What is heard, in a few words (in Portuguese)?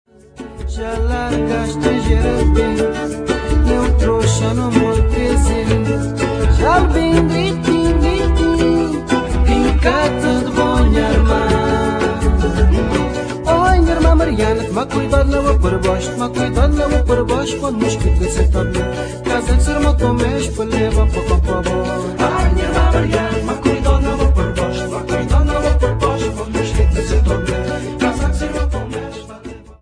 cordas